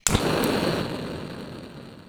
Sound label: blowtorch